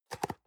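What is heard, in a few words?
box small close medium, Büroarbeit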